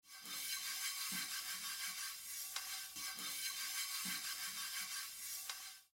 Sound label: washing utensils; water